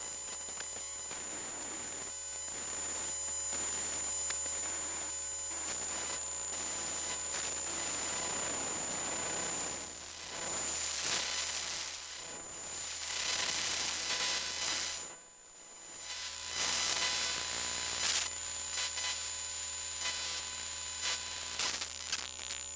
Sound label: Electromagnetic Computer Load Mono; Essentials Series NOX SOUND